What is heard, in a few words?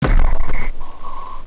door move